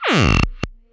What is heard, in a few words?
laser